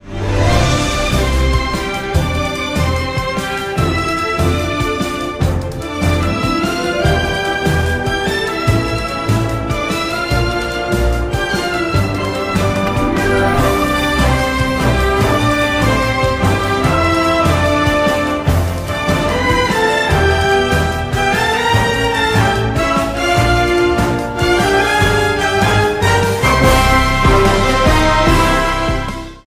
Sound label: Level Complete; TSMBM